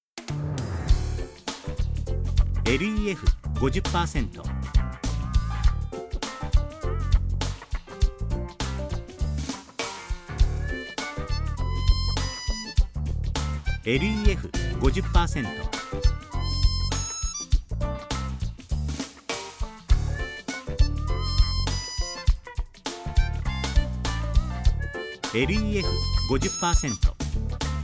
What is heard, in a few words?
m fusion